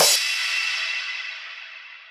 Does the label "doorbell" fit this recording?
No